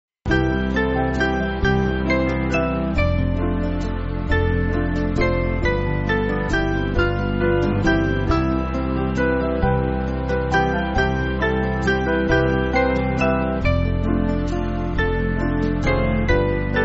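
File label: Band, Music Backing Files for Churches; Master The Tempest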